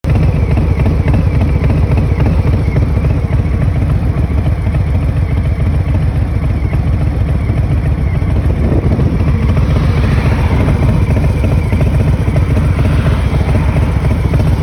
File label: streetbob sound; lorrys